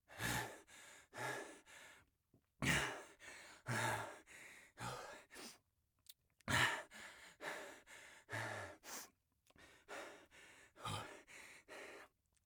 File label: breath hurt; Escape from Tarkov; scav1